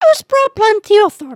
sway, emotes

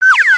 Pet Come Here; creatures pets

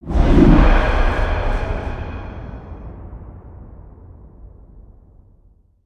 Wind Leap, ambience spaceship; Starbase

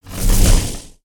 Spinjitsu Lightning One shot

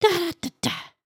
hat dance, emotes

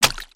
paint splats; build mode

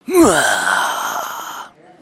ninja groan; avant gardens

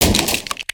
Crates n Boxes, destructibles

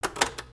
Pet Teleport Clicks; creatures pets

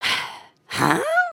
Shrug; emotes

